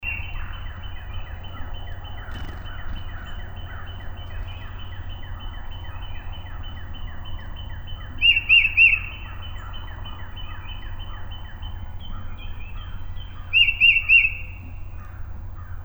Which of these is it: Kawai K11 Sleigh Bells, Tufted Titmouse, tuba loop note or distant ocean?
Tufted Titmouse